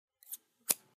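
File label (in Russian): звук ножниц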